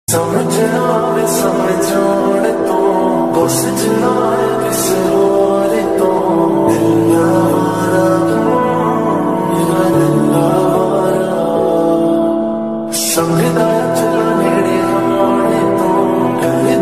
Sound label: ringtone slowed reverb lofi ringtone bgm